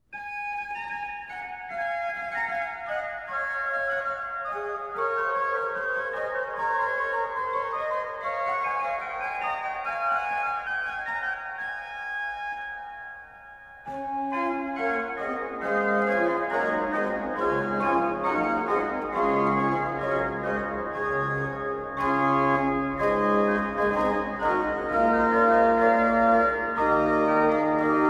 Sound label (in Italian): Canzon, organ; Andrea e Giovanni Gabrieli; Sol sol la sol fa mi